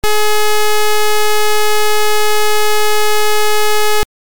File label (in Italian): suoneria beep